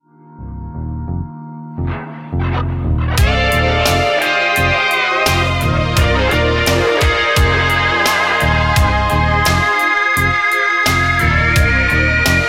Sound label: harmonizer; vibesware gr1